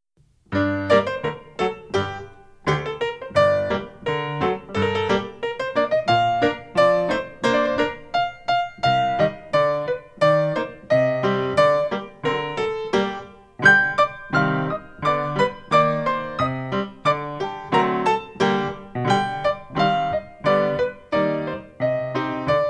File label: Russian folk Gm